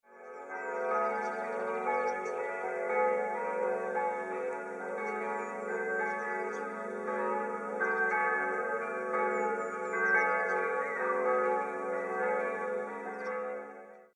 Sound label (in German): Glocken St Martin; Altsteusslingen